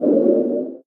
Ghost Block Passthru; Sfx - Ghost Block Passthru Var3

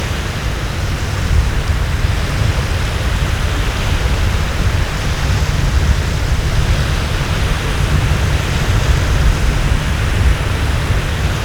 Riverboat Jazz; the princess and the frog; Bayou; Ocean Wind

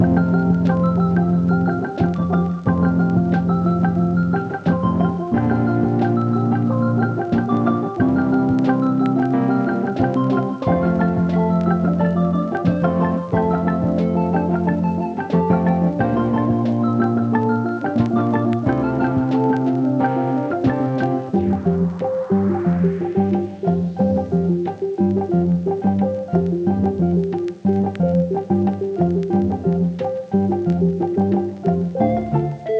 Speedy Loop Vinyl 180bpm; Opseck; jenny leclue detectiv

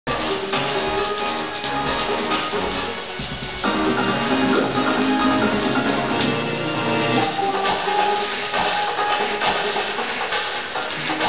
Shower; wheres my water soundtrack

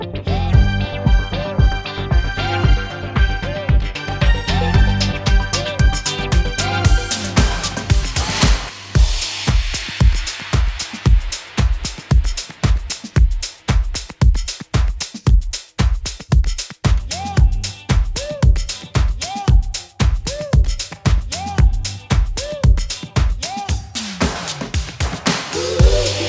Rhythm EDM (114 BPM All Stems Assembled); Yum Yum Cookstar

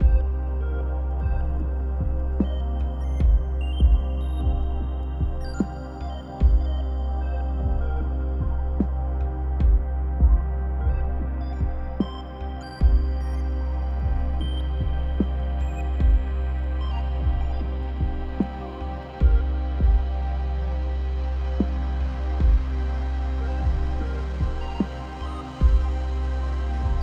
Ambience 75BPM; Benefactor

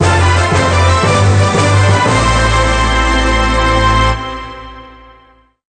Music Game General event

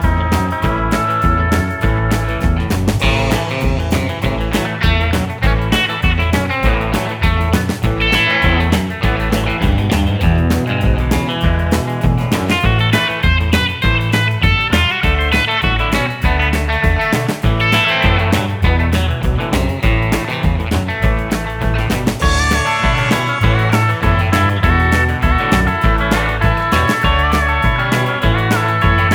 Diner Jukebox

